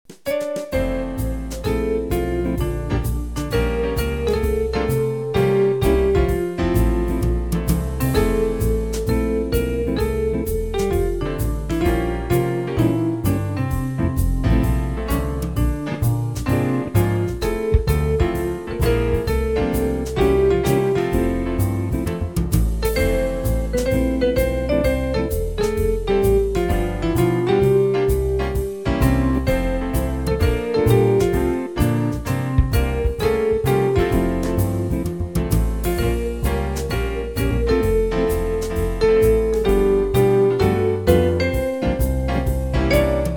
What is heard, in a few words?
jazz